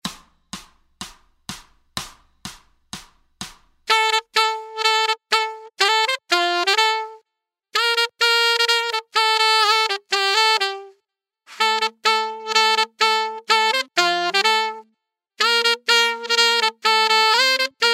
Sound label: Jingle Bells Akapella saxophone